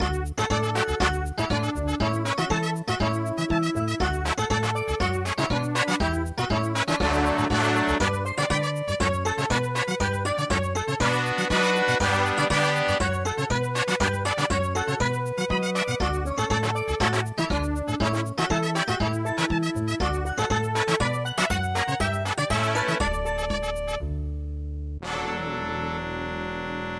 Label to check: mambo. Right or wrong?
Right